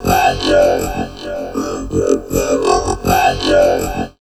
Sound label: VOCOD, Drum Loops Crazy Processed